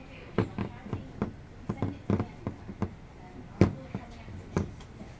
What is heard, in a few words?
sheffield greno wood